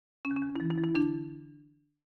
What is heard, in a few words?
Marimba Intro; Factory